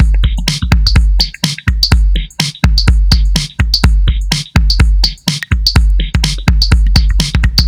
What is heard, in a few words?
Stew Tap B, minimal